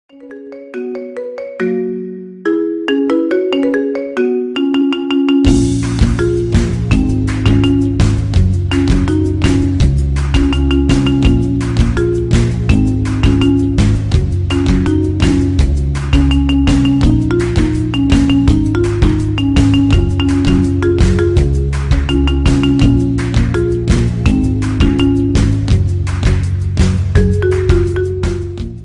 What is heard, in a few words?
marimba remix ringtone; iphone